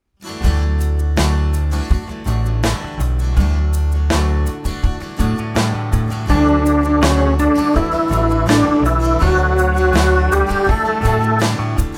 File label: melodic dictation